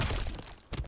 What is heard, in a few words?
brutal hit